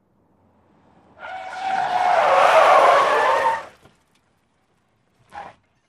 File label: Sm Car 180 Rev Skid, compact